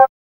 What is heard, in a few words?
Cow bell; Roland MV8800; CR-7800